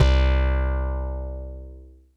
Synth Prophet Bass C; Electronisounds

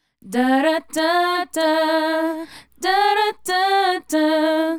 Female Group, Hip-Hop and RnB Vocals

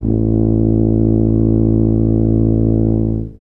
tub A2, Tuba; Best Service ProSamples vol.51; Classic Orchestra 2

